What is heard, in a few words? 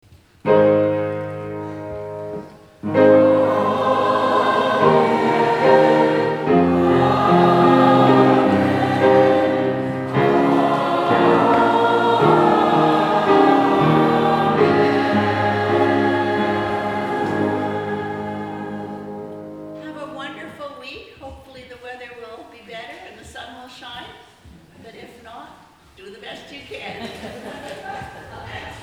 Amen